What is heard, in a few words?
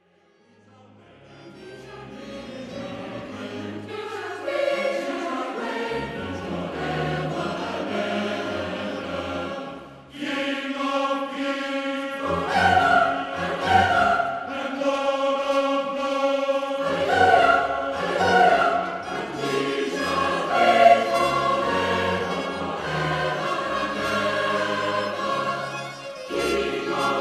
example distort, In this track, the musical example in track example.flac is distorted by a small amount.